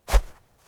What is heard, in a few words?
footsteps snow